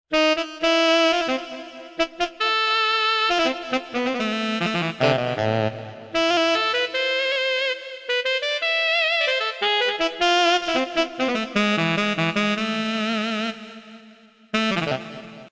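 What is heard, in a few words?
tenor sax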